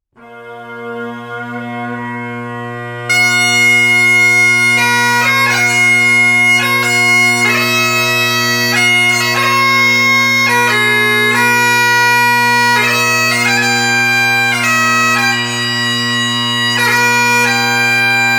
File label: bagpipes